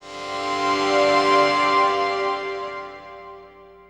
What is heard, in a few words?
Elektro Mass, Elektrik Drone, Sky Church